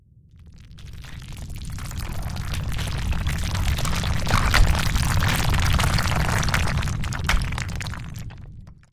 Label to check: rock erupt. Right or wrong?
Right